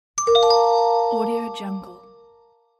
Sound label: message notification